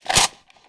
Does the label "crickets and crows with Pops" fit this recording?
No